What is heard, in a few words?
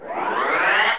Throw Powerup